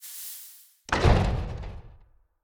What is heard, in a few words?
silo clamps on; factorio; Factorio 0.14.21